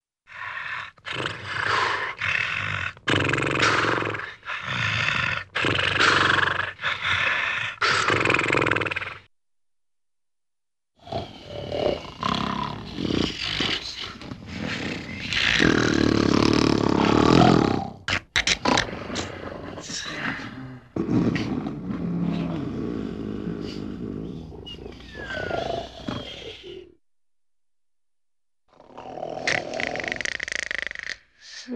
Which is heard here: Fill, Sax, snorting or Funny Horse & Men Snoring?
Funny Horse & Men Snoring